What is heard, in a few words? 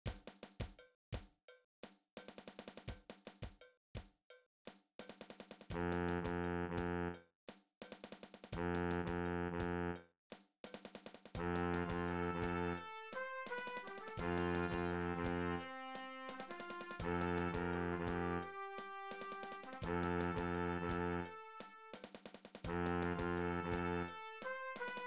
lavere tuba, Bruremarsj